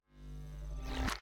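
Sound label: Timer Turn-On Reverse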